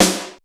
snare drum gate; SNAREDRUMS

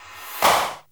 REVERS CLAP

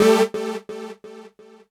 KEY SHOT TEKNO 140 A; Techno2; Singleshots

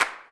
Clap

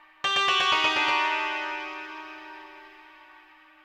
ethno, 125, A

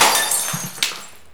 GLASS BREAK; JUST FOR FX

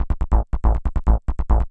SNTH BASS ELCTR 140 A, Techno

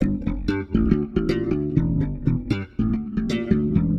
Warped Bass, 120 C; Loops; Cosmo Slaps; Touch Loops; CosmicFunk

